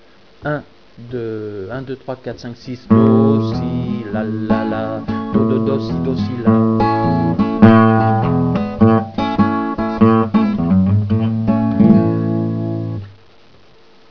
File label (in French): TARENTEL, guitare